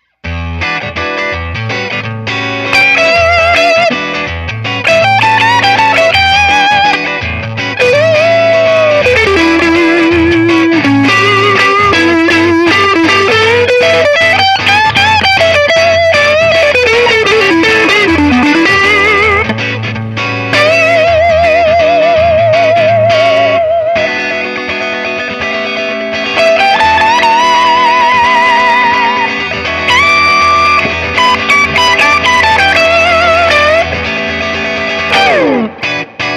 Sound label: Strat Rhythm Lead Brite Down